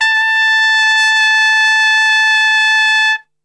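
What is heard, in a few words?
A 3 piccolo trumpet, Brass; Best Service; Brass Super Section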